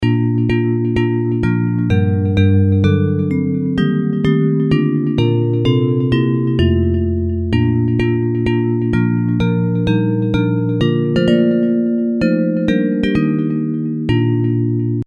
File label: Bells